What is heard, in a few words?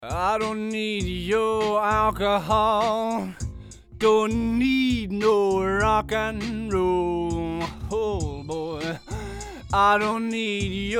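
Speaker Foldback Cardioid Null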